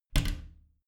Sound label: bathroom cabinet creak close regular; objekte; tueren